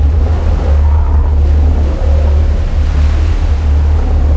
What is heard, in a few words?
ocean, tracks